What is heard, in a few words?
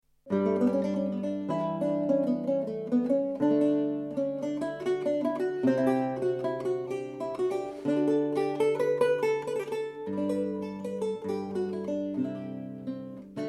ricercar; dalza